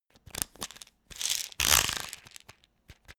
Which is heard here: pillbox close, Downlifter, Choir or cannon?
pillbox close